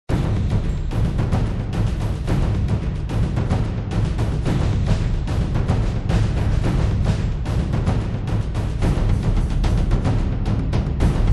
Fight; Space Engineers

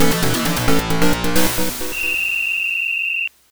Soccer Goal; Sports

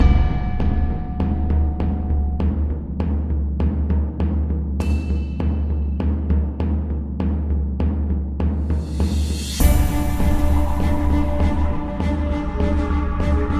Suspense Theme

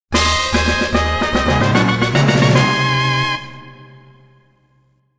Fanfare - Evolution

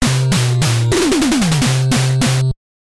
Unused Fanfare; Secret Ties; NES

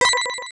Credit SFX

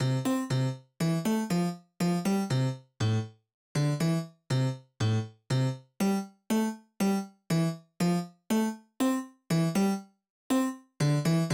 Roland SC-88; SimCity 2000

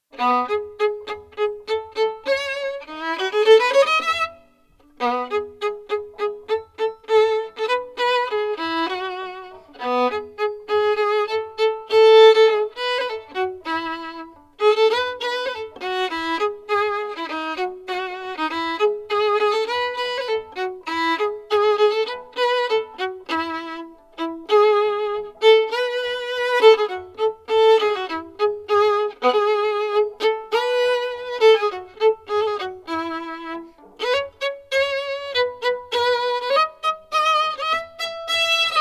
Violin; Assassin's Creed Syndicate

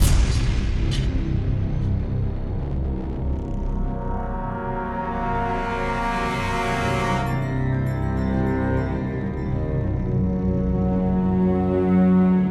Music Loading Track; inversion

